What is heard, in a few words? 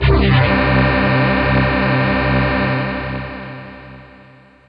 huge harmonics synth